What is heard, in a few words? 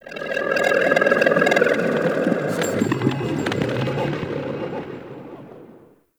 Excavation Scanner Random; Warframe; Missions